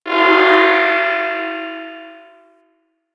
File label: Hull Breach Alarm; Hull Breech